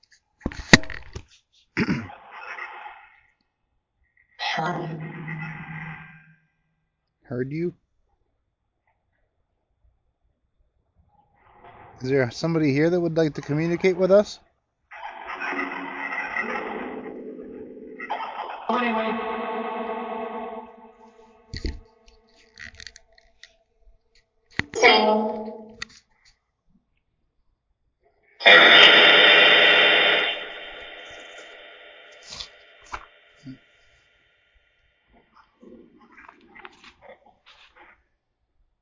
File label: Entrance Phasma Box